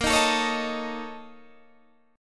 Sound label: Bb Minor Major 7th Double Flat 5th, Guitar, DADGAD